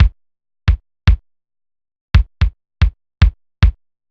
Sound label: linn drum beat processing